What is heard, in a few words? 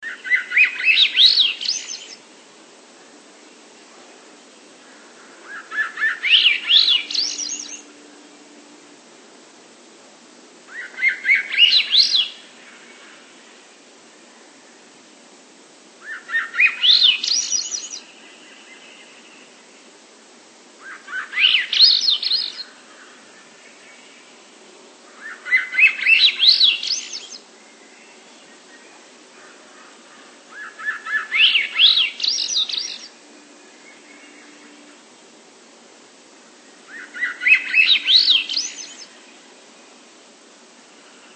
Swainsons Thrush